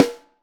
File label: Snare Top